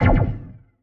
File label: police car weapon fire int; Original TK Sounds